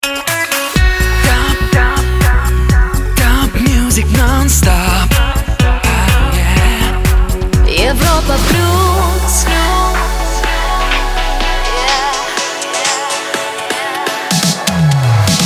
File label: Jingle Main ID; Europa Plus